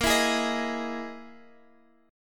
E Major 7th Flat 5th chord

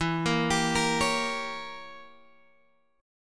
E Diminished 7th E, Guitar Open F; Edim7; arpeggiated

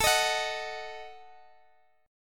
F sharp Minor 6th, Guitar

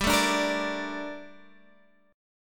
Bb Major 7th, G; Bbmaj7/G strummed

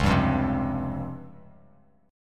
Db Major 7th Flat 5th, Bass Standard; 9,10,3,5; strummed; chord shape; JGuitar

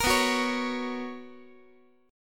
B Major 7th Suspended 2nd Guitar, chord

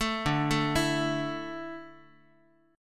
D Suspended 2nd D down Guitar, chord; arpeggiated

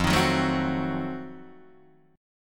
F 7th Flat 9th, Guitar Open D

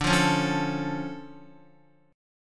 D Minor Major 7th D down Guitar; DmM7 strummed; JGuitar

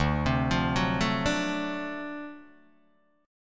D 7th Sharp 9th D down Guitar Open D

